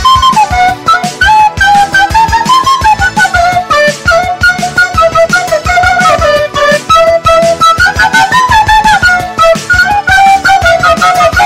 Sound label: flute